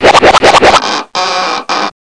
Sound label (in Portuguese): amarras; 7invasores